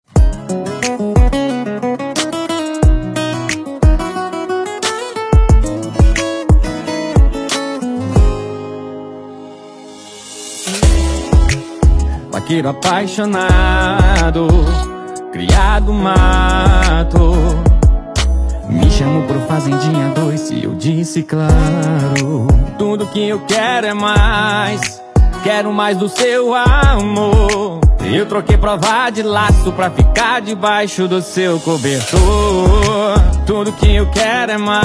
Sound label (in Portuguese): sertanejo